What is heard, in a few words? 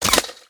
damage hits explosions; World Of Warships